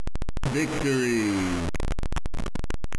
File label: Victory; Super-Robo-Squad